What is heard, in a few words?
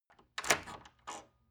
wood door old lock turn key short